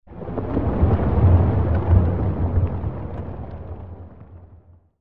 Gate Chamber Earth; ninjago

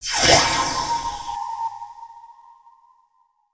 Crane Pose

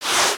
Text Outgoing; aronwk collection; ndaudio

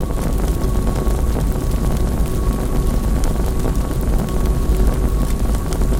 Lantern Fire; Ninjago

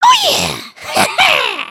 flex; emotes

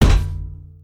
box fall; weapons; aronwk collection; ndaudio

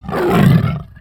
Pet Lion Roar; creatures pets

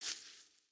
Foot Grass Walk, footsteps

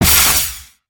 Barrier Shock, ambience spaceship

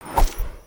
Summary Whoosh; aronwk collection; ndaudio

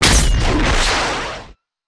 boost, vehicles; aronwk collection; ndaudio

